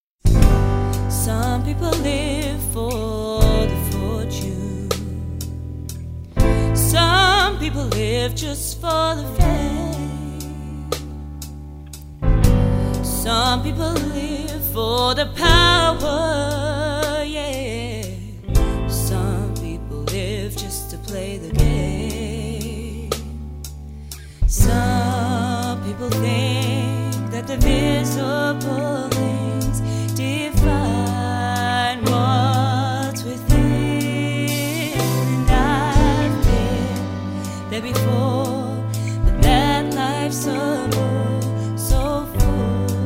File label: Male Vocals